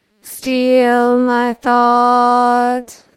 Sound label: singing